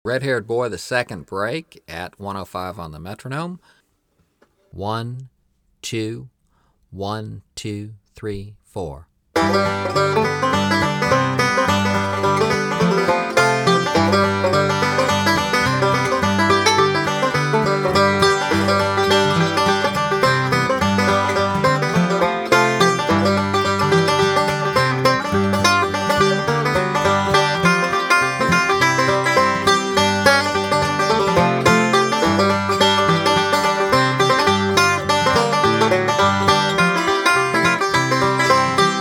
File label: Red Haired Boy Break 2, 105 Bpm